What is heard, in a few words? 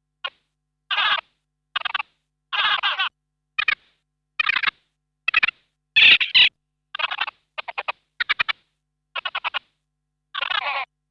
Frog